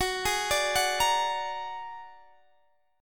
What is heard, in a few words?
Bb Augmented 7th Gb